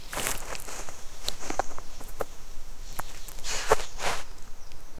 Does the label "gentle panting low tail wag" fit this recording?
Yes